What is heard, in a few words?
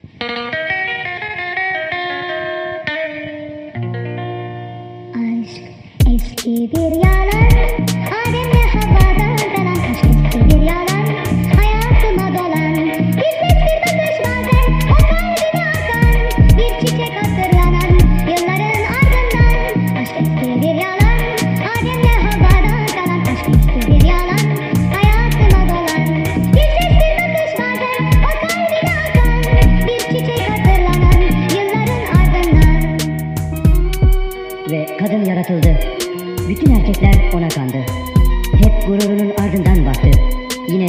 crazy old electro